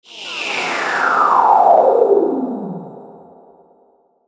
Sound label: Sweeper Airy Descend; Production Element Imaging Element Accent Transition; Airy Sound Effects